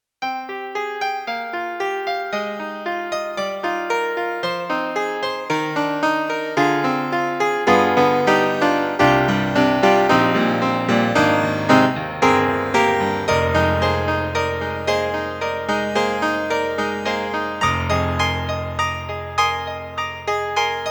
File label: E-MU SoundEngine Bright Acoustic Piano